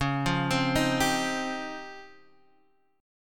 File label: Db Major Sharp 11th Db down Guitar Drop D; arpeggiated; chord shape; JGuitar